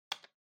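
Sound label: Portable Stereo Remote Control Button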